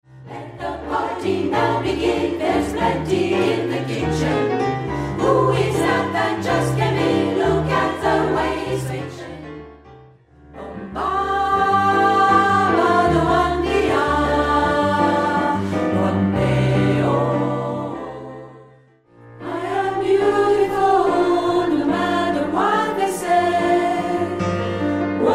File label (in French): jazz women et rythme